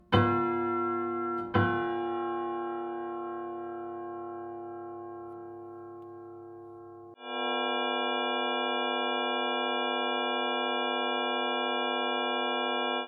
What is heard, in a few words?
Multiphonics of the grand piano, flageolets